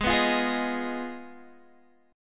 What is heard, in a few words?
B 6th Flat 5th G sharp